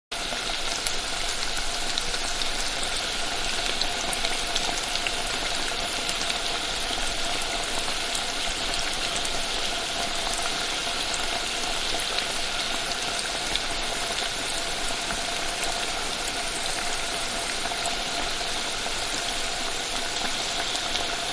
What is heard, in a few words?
Frying Medium, Frying-Deep Fat-Medium; Food Prep (Sound Effects:Restaurants); Manhattan Production Music